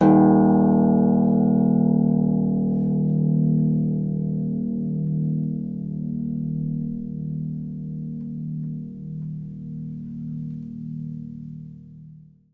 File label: Harp E1; Miroslav Vitous - Percussion & Harp